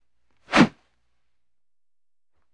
Baseball Bat Swing W; baseball fx & games

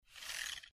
Short Squeaky Mouse

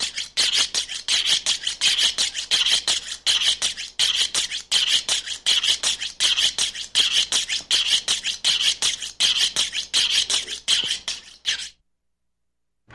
Sharpen Knife Rhyth, utensils

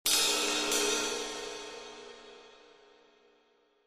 Cymbal Shrt Cacophon

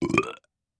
Belch Regular; belching

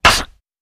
Crocodile Jaw Snap; Animals-Wild

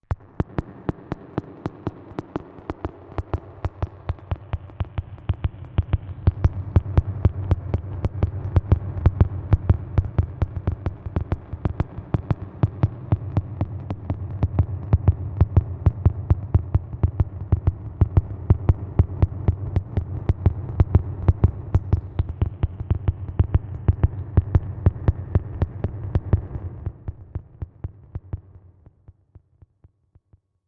Click Heart Machine, electrical

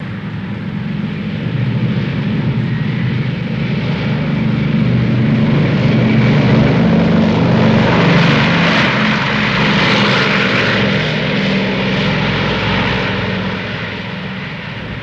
B29 Take Off Powerful, b-29 superfortress; B-29 Take Off, Powerful End

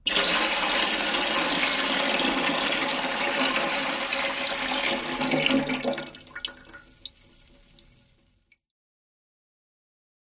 Water A Metal Trough, pouring

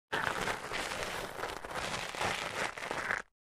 Object Dragged Acros, rocks & dirt

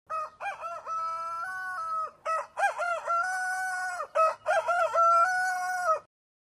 Rooster Crow High

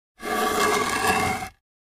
2x4 Dragged On Cement

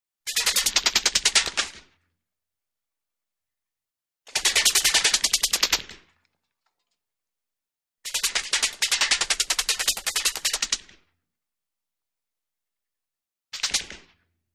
M60 Bullets Impact Me

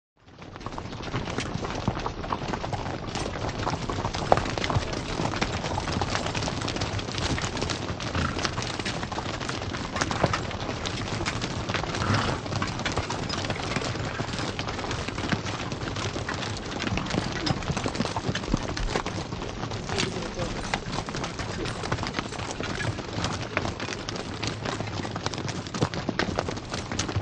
Horses By Arab Army

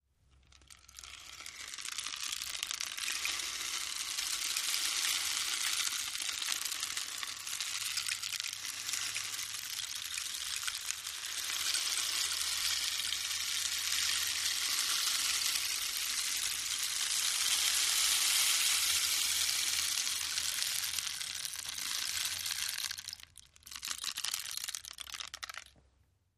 Rain Stick Slow, rain stick

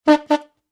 Bicycle Horn Classic

